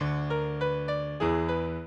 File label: minuet, mozart